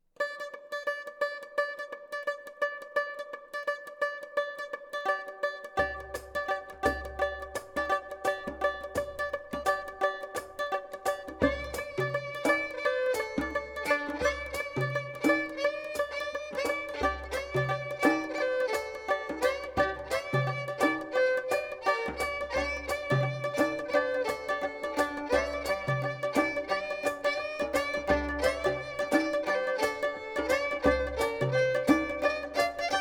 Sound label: banjo